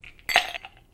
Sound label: ice cube glass, ice cube dropped in a glass